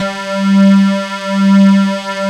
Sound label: WS PLK SQU